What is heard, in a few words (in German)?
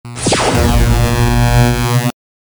stromausfall